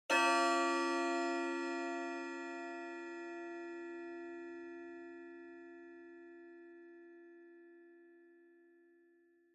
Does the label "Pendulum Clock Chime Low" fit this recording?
Yes